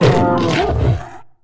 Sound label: tukata dead; Star Wars Knights Of The Old Republic I; XBOX